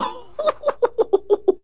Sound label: laugh